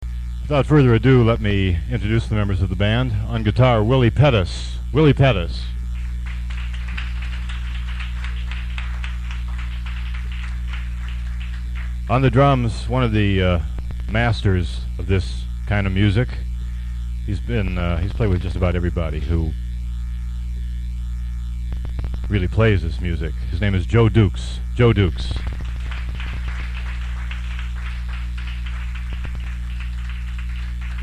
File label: grooves blues, organ